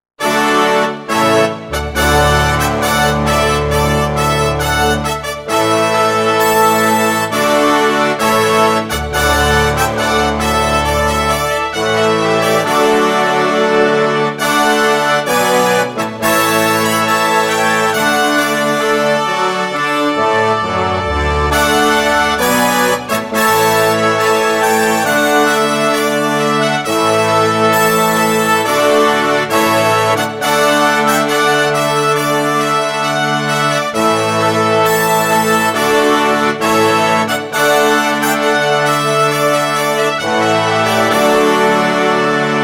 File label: Trumpet Voluntary